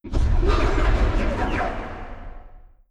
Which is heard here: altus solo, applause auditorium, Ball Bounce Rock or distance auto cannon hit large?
distance auto cannon hit large